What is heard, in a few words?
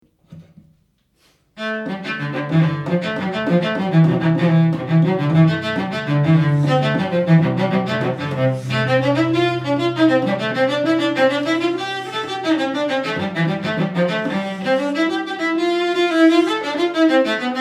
Cello; Colombo